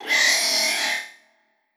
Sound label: Spider